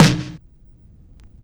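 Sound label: Vinyl Snare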